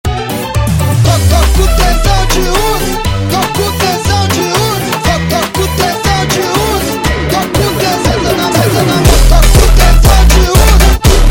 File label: Bad Ending Jumpstyle, funk